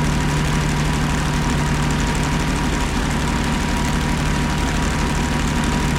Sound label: panther engine rpm 66, tanks; War Thunder